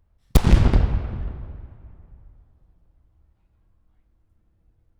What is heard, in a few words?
Cannon Hard Left; Telarc Sampler, Vol 5